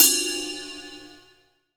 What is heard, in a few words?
DX MIX RID; AKAI S6000 CD-ROM - Volume 1